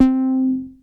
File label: Bass Synths hits and octaves